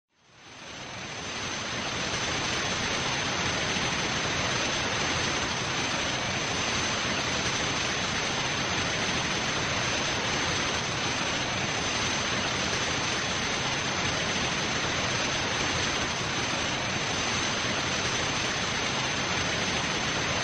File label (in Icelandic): brumandi tónn